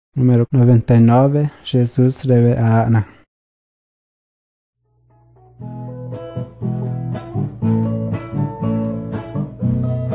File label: songs guarani mbya; bible